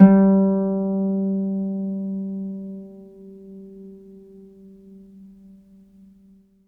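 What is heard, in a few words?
HARP A3 SUS; ILIO; Synclavier Strings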